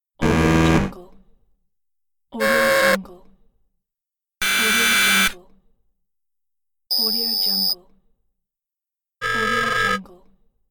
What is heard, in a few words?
quiz buzz